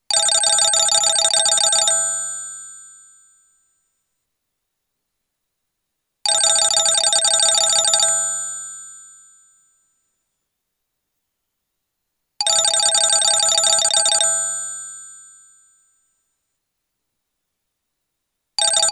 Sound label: Old Egyptian Phone, ringtones; old phones